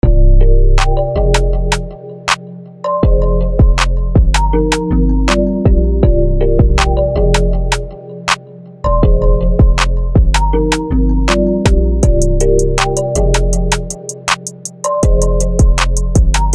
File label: Bells, beats